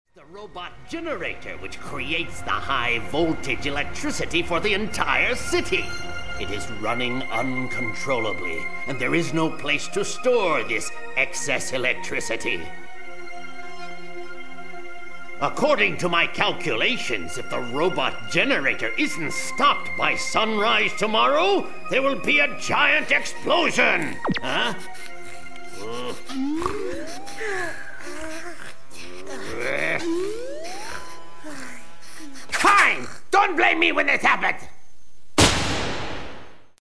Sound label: yawn; sonicmovie